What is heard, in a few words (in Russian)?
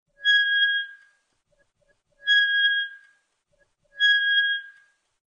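скрип ворот; Skrip vorot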